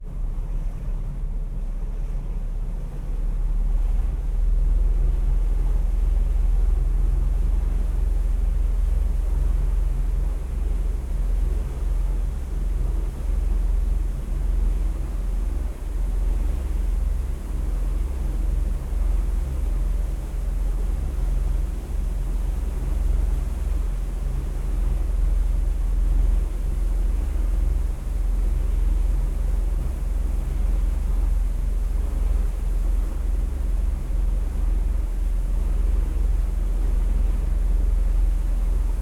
Engine Roar N Accelerate than Stop, Water Hiss Dominant, Look Forward at Prow, Noise, Overwater, Boat Ride; NTSF1; LukasTvrdon